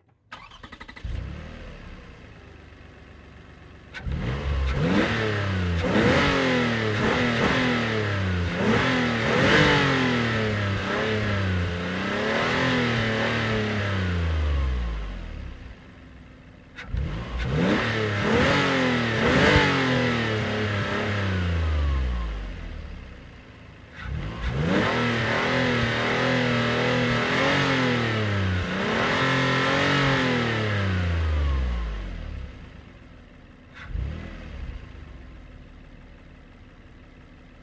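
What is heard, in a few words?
Car Highlander Outboard Revs Hard; 2005 Toyota Highlander V6; Cars; Vehicles; Big Room Sound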